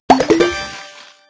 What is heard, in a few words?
Box blow